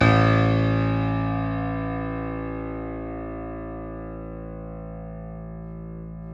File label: A 0, YC7 Piano mf